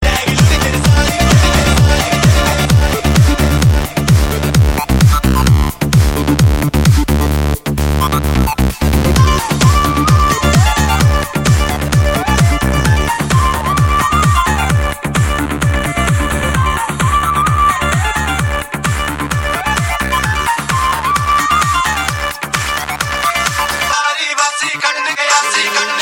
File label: remix tone; hip hop